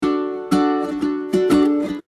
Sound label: instrument nukulele rhythm